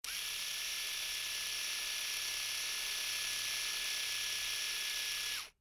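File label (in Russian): бритва для бритья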